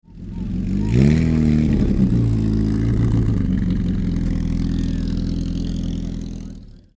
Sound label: Morgan F trike; 1938